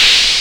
PERC FX, Big Beat; Big Beat Samples & More; AKAI

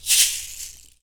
shaker, shaker loops; Roland LCDP14 Africa VOL-2

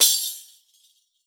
tamb, percussion; WORLD PERCUSSION; MIXED PERCUSSION; Best Service ProSamples vol.52; World Instruments 2

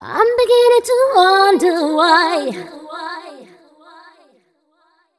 f 128 mel e, fem Vocals

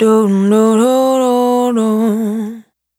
Dry, Single Voice Melodic Loops; Integration 01; Singles

